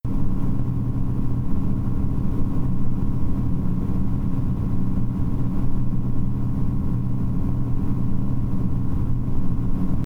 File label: 1000 RPM